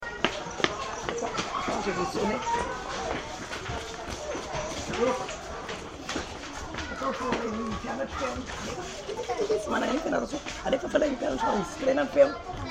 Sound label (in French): sonnerie école